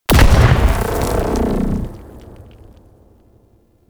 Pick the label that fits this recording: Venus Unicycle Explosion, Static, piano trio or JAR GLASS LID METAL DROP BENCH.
Venus Unicycle Explosion